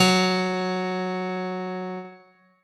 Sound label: keyboard f sharp high